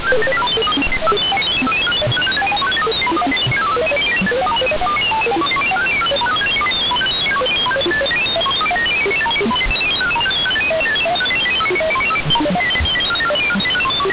sid MOS6581R4; Lunattack

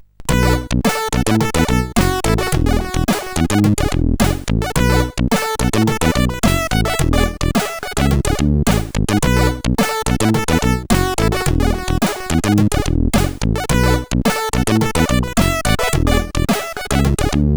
sid CSG8580R5; Abaddon; Mr Solid

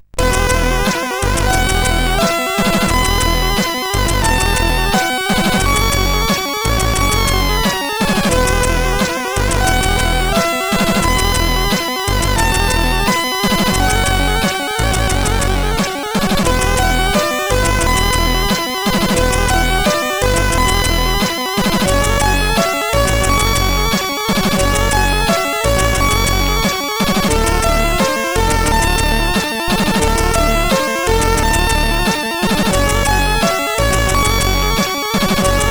Intro Dragon tune 1, CSG8580R5

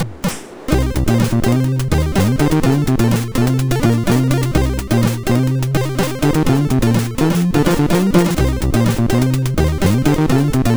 Bassmania, MOS6581R4

